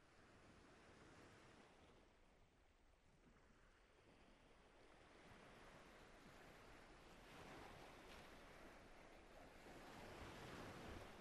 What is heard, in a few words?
Ambient OmniSphere Sirens